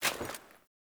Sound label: Foley Action Armour Walk; Player; Deora